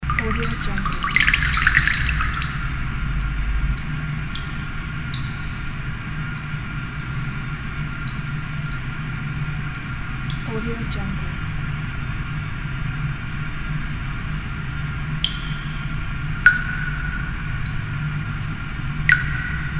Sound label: underground ambience